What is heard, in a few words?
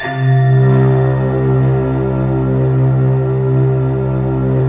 glockenflute, Glocken Bell; Optical Media International; Sonic Images Library